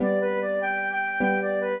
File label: trio, flute harp; Mozart